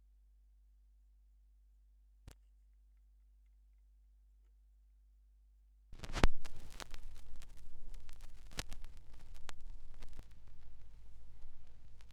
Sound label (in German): Schallplattengeraeusche